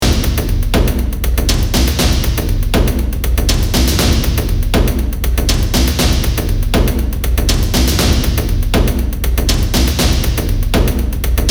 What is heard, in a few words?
cinematic drums, صدای درام